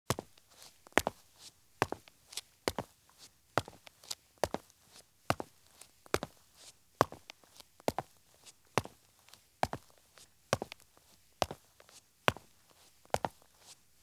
Medium Running Shoes Walk at Medium Pace on Concrete; Sound Ideas; Footstep Sound Effects